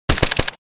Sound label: AK 47 assault rifle suppressed fire; gfxsounds